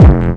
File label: BD HARD; WaveLab